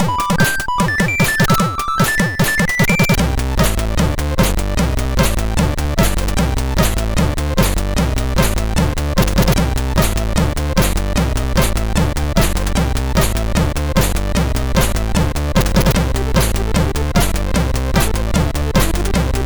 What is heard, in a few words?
sid MOS6581R4